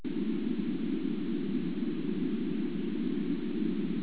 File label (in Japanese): band 250, 1/1オクターブバンドノイズ 250Hz -20dB